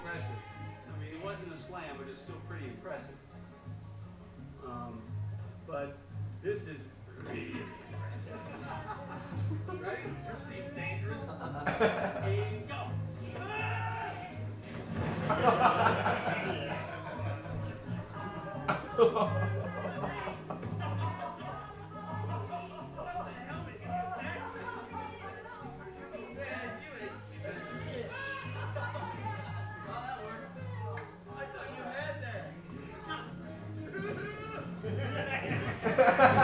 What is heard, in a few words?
the laughing lads